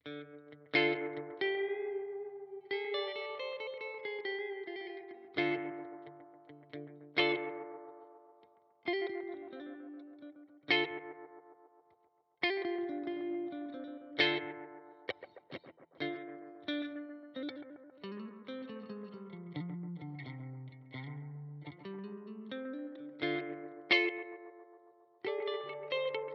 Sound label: Memory Boy Tap Divisions Slapback